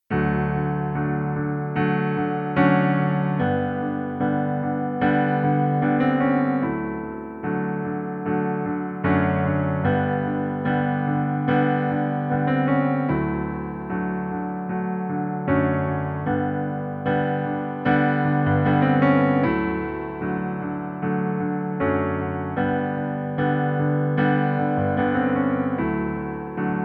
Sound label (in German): Pianoplayback D-Dur